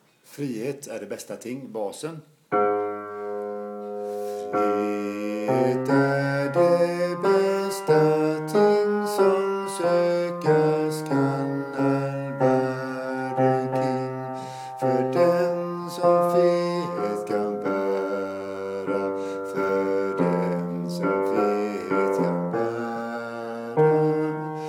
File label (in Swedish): bas; Frihet är det bästa ting